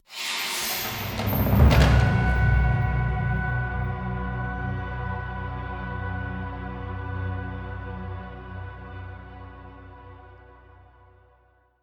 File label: Mini Boss Win; Ginso Tree Mortar; Ori and the Blind Forest